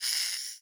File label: centipede jump